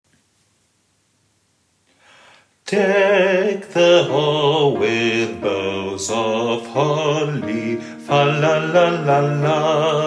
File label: Deck the halls Alt; Popchor